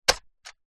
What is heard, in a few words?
science fiction robot android single footstep wood